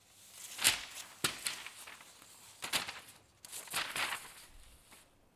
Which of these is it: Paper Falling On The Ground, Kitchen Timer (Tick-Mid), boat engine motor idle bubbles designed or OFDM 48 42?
Paper Falling On The Ground